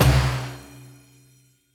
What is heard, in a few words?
spingle Drum End; netent